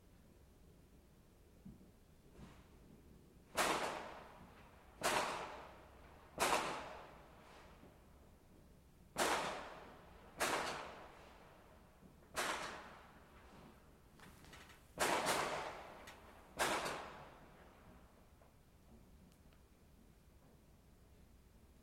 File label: brief burst of diwali fireworks from my west london window